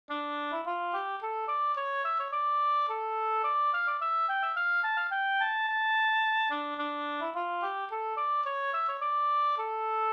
polska Storpolska

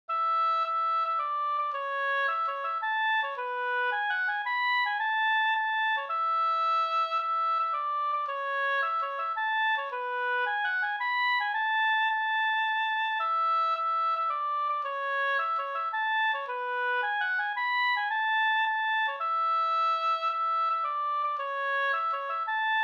polska Bodapolska

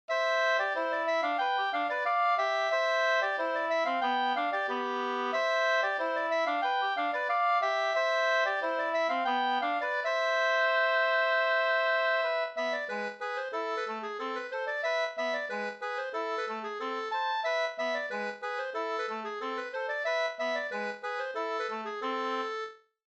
halling, 2st

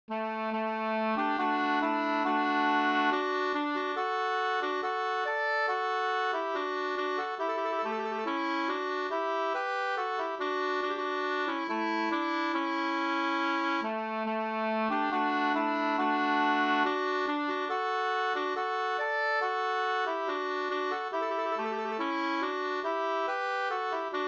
brudmarsch; Lejsme Pers brudmarsch; Malung

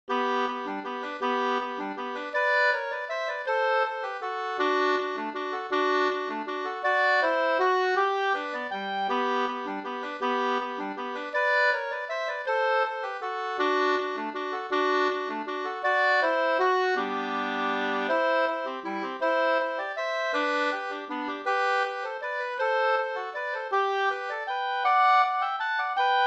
vals

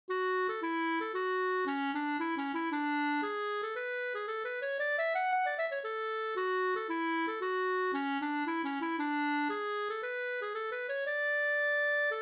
polska springdans; fr Hedemarken

